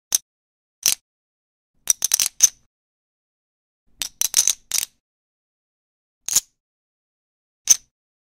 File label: Poker Chip